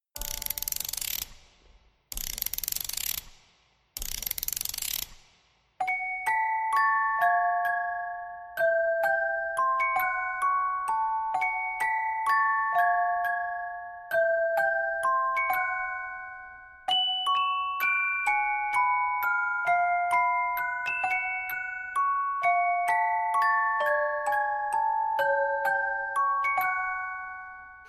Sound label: Carillon